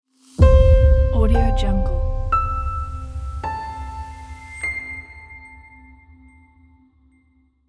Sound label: logo reveal